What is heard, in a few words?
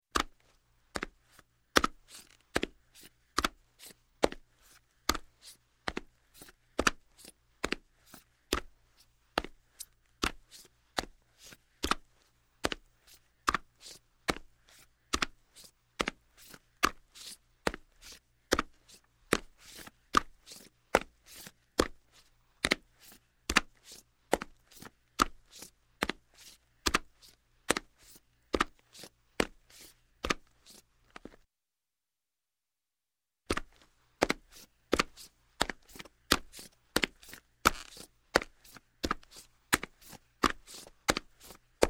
Footsteps, Linoleum - Boots; Sound Ideas 2000 Series